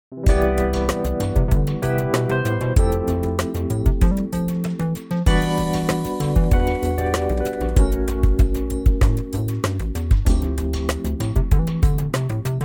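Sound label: smooth, Backing Tracks for Male Vocals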